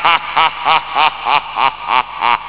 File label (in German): lachen; rocks n diamonds